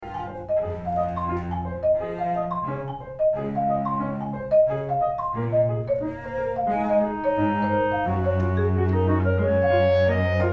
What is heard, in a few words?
groove surfing